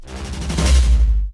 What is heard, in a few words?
Break Mono Jolt